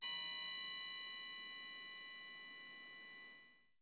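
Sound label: triangle, left; Miroslav Vitous - Percussion & Harp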